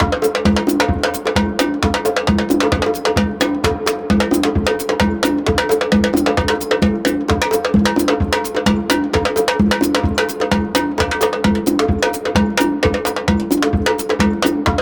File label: CONGA BEAT; Ethnic; Zero G - Ethnic